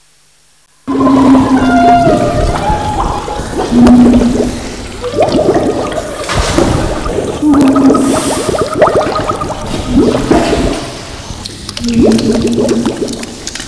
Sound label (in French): eau